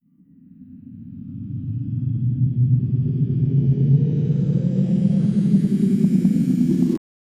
spaceship taking off

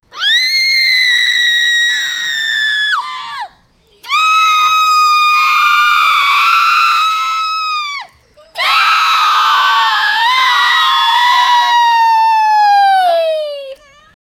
year old girls screami

